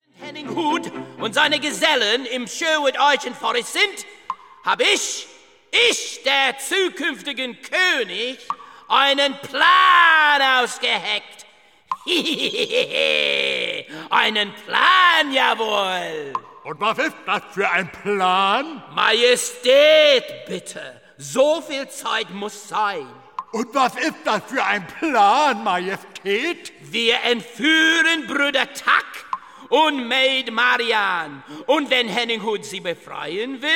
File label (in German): Acapella Maennerchor